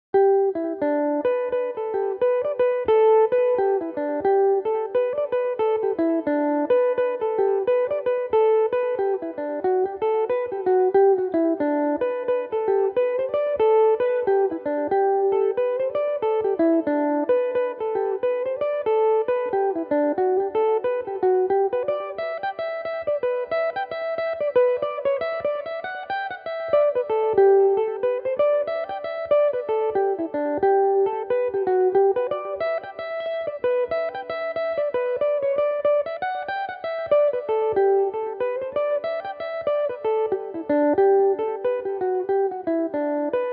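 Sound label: G major, irish mandolin, tunes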